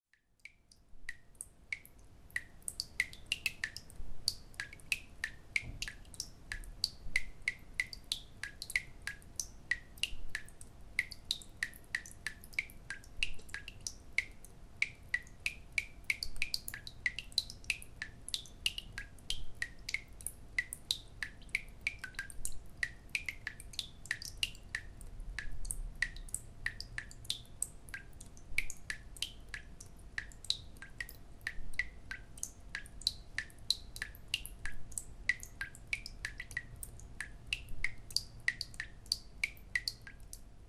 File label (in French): voix d eau